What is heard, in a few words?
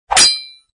katana